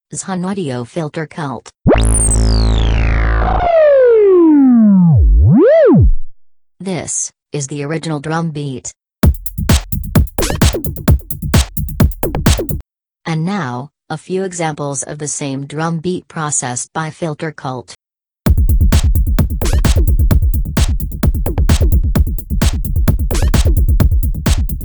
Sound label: nonlinear beats